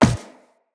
Walk Metal, Footsteps; Operator

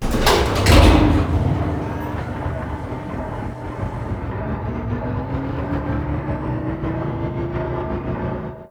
Gas City Spy Vault Door Open; Warframe; Corpus Gas City; Gameplay Remaster; Ambience

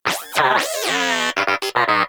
Moa Inquisitive Vocal Enemy Spotted; Pets; Warframe

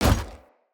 Heavy Grunt Boot Walk Gravel, Footsteps; Enemy; Sinecure

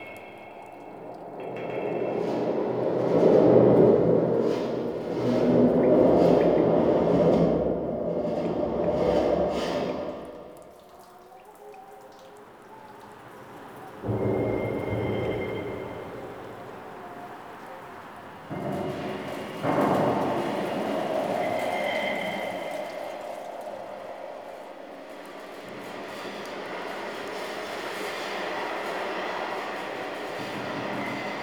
Interior Sewer Bed Loop, Ambience; Nineteen Ninety Nine

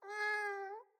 Kitten Meow Playful, Enemies; Catbrow

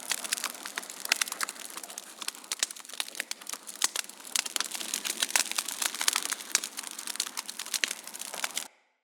Campfire Loop; Props

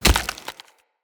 Wood Flesh Impact; Weapon Impacts

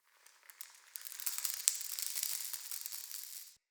Cave Dirt Trickle

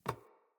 Ladder Climb Wood

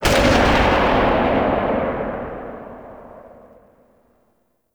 Quad Sniper Tail; Warframe; Weapons; Tenno

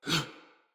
Attack, Battle Barks; Envoy

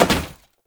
Step Metal, Foley; Warframe; Oraxia

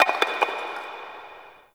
Zariman Stone Debris

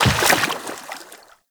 Step Water, Footsteps, Walk; Warframe; Characters; Tenno

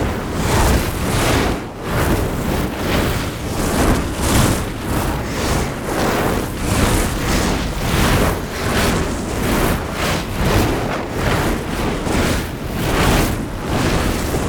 Flying Loop; Warframe; Uriel; Infernalis

